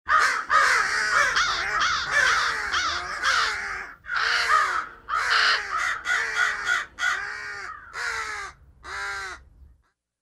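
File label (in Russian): вороны призывно кричат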